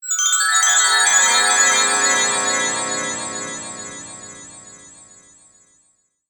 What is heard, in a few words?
Casting long fairy magic spell; ao thuat